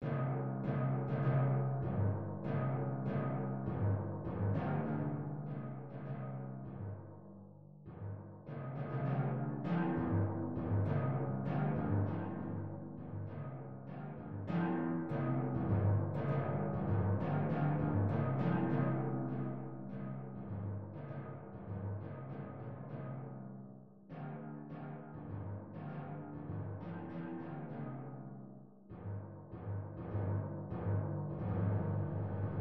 Timpani Track

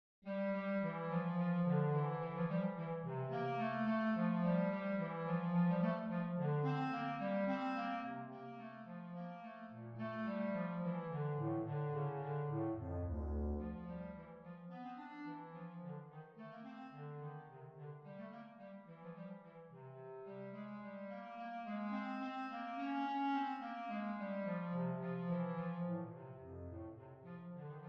Bass Clarinet Excerpt

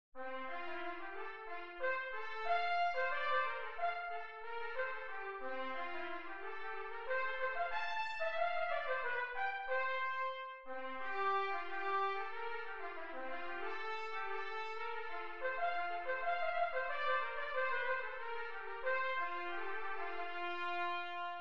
Trumpet Excerpt

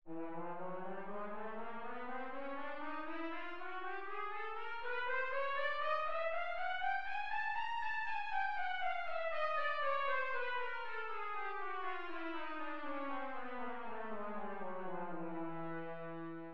Trumpet Chromatic

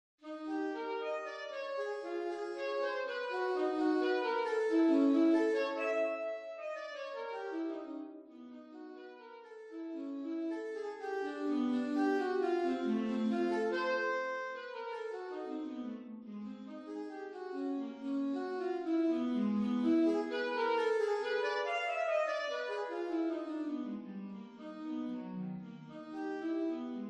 Alto Tenor Sax Track

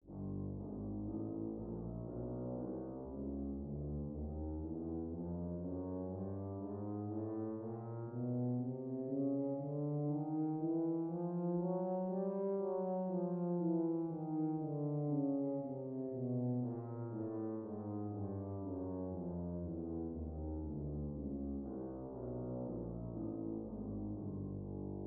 Tuba Chromatic Scale

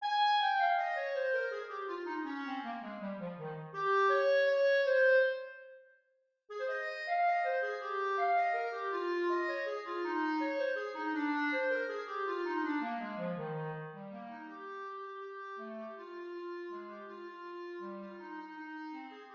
Clarinet Slow

